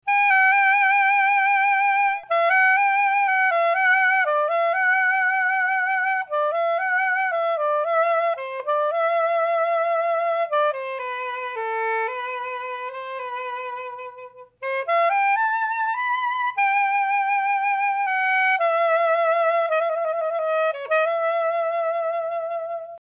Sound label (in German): sopran c melody